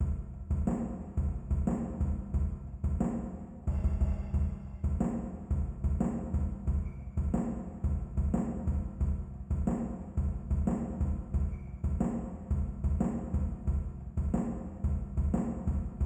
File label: Drum Loop